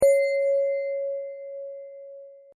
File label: bell